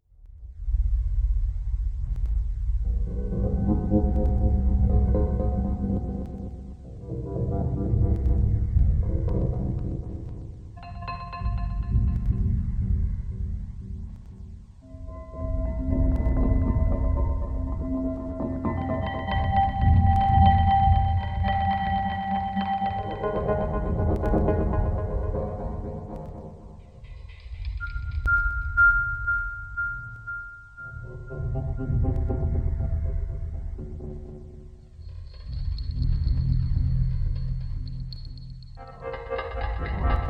Gb Locrian 60, Deconstruction

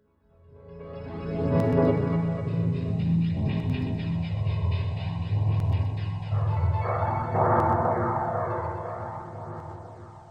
Gb Augmented 60, Deconstruction

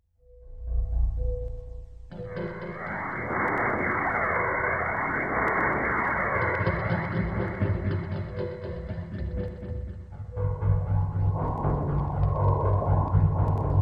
E Mixolydian 60, Deconstruction

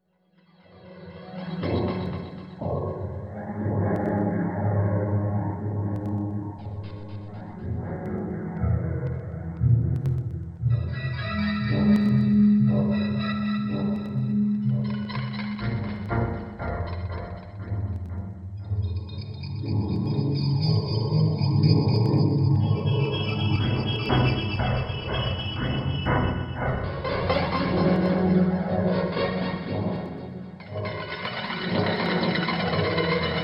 Db Diminished 60, Deconstruction

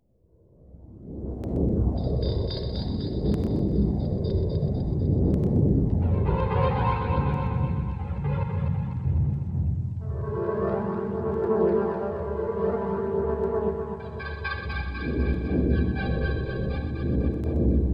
A Dorian 60, Deconstruction

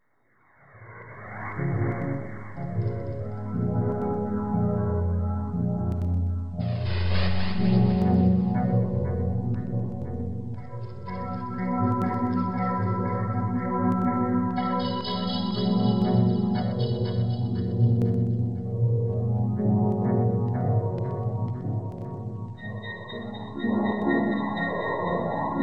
Eb Mixolydian 60, Deconstruction

